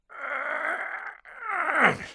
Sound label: tin pull grunting; 2fast2curious